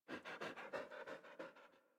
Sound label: Puppy Idle Pant; Enemies; Vocals; Kubrow; Warframe